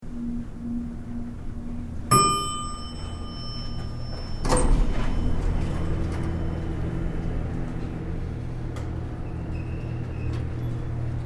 Elevator Door Bell Open And Close Then Even Hum; from elevator interior; Miscellaneous Door Sound Effects

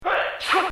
Sneeze

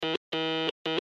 Morse Code R Letter; Blastwave

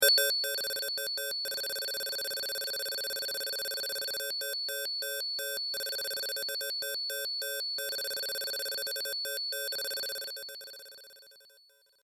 SciFi Scanner; Communication; Perfect for general Science Fiction usage or Multimedia usage; Sci-Fi Scanner Sound Effect; Crafted Signals

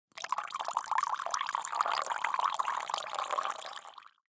Coffee Pour From Thermos; Pouring; Foley; Blastwave

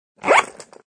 Quick Luggage Zip, Zipper on luggage; Zippers; Objects

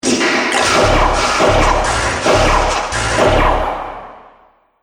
Starship Heavy Lasers; Spacecraft Sound Effects

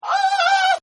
Scream Male; Screaming; Sound Effects:Voice

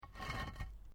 PAVING STONE MOVE, dragging

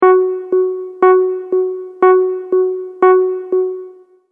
Multimedia Tone-Thinking/Processing

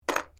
COUNTERS PLASTIC GAME DROP; Casino Sound Effects

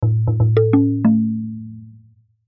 Logo Tabla Rhythm Drum; Blastwave

